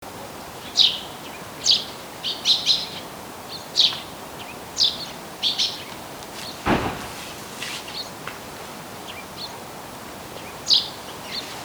Passer montanus

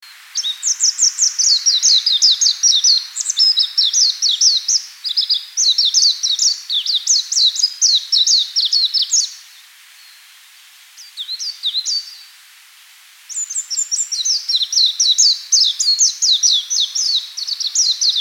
Zosterops japonicus

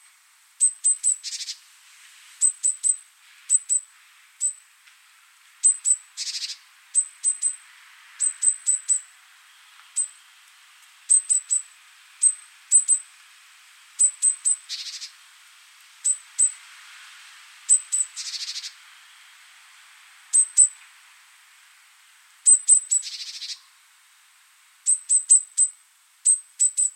Parus major

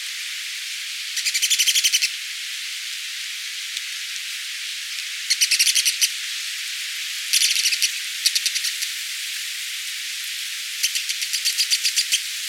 Muscicapa dauurica

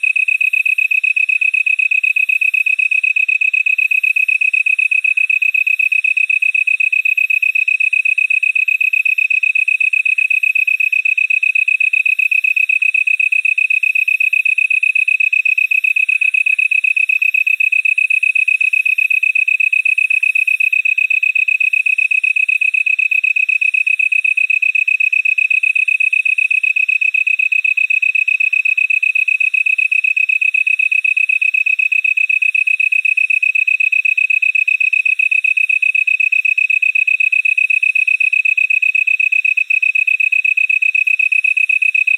Oecanthus longicauda

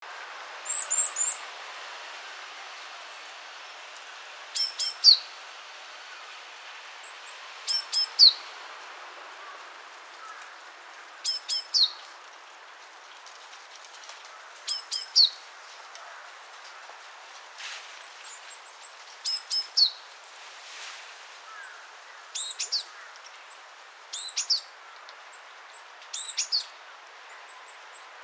Parus major

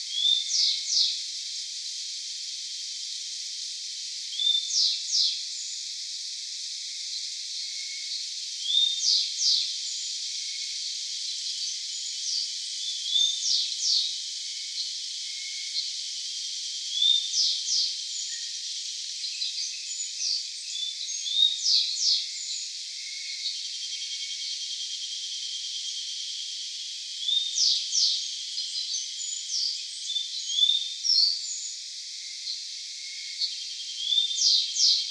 Emberiza variabilis

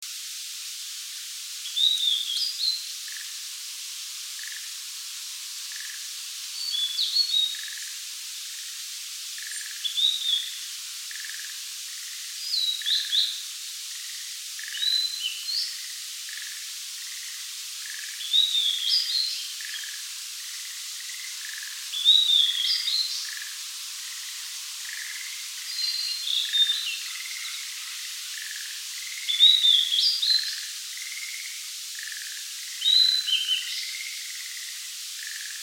Cyanoptila cyanomelana

